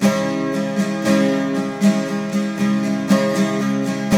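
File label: 12 string minor D, guitars; Spectrasonics; Hans Zimmer Guitars VOL-1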